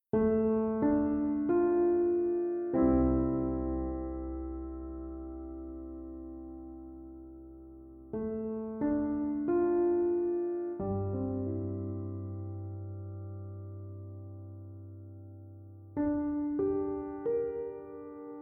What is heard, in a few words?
epic Cinematic